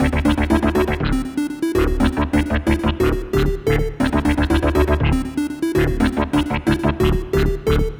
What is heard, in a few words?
whole tone and bass; low-pass filter automation on gated chords; Rhythm Quest; an arpeggio that has some long reverb on it